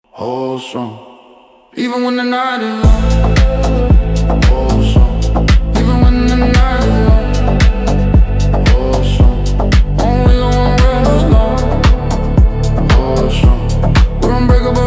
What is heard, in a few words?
trap mafia house